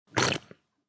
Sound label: Sip, Sipping sound.; Drinking; Human